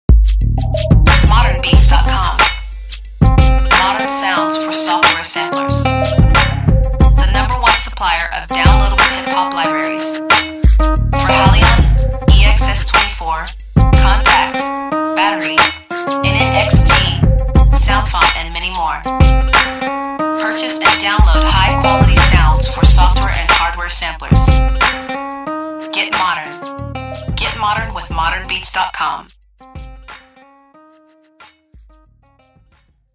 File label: Guitar; GuitarElementz2